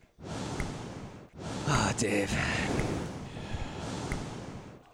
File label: breathing